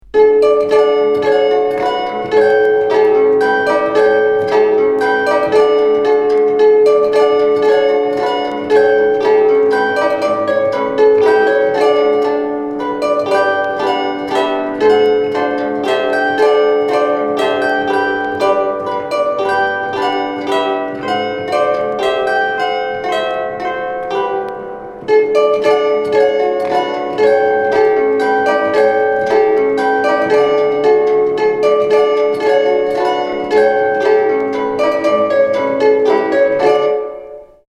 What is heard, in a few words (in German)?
musik mechanisch